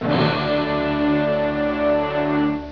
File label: orchestra